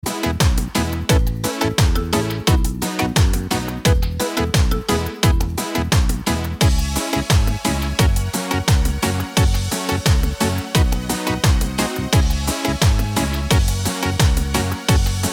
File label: cumbia